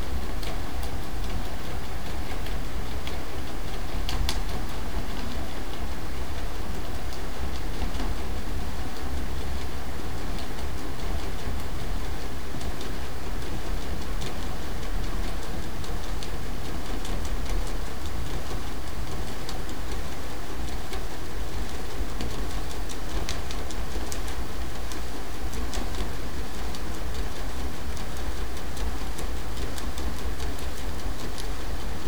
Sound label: Transient, hydro events